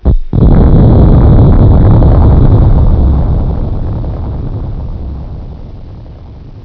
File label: BOOM